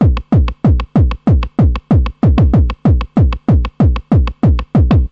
190 bpm gabber, DRUM LOOPS and BREAKS

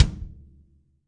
big ol yamaha kick; Kicks; single hits

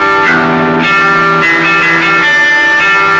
150 alternative ballad, GUITAR LOOPS

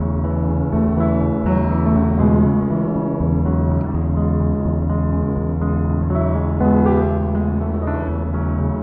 pianos gone tuning loop; KEYS ORGAN PIANO

